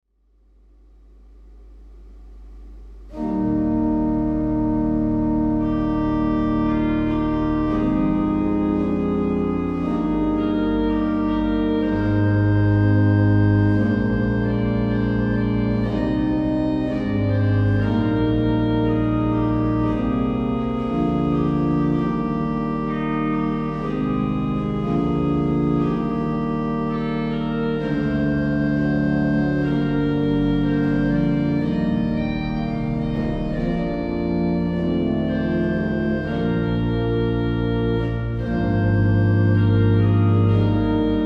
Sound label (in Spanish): oboe solista, órgano